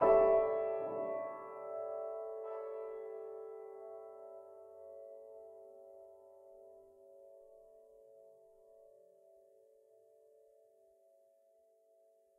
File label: Piano